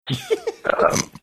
giggling snorting

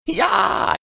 imitating wilhelm scream

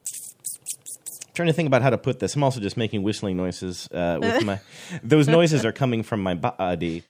whistling noises

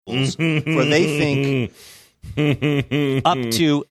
groaning laugh

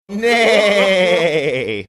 neighing funny

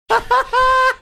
funny laugh

